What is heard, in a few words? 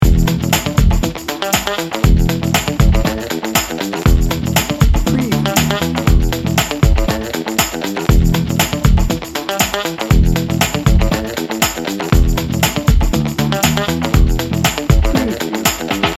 funky lickin good loop